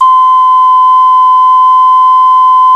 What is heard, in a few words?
CLAYFLUTE, Heart of Africa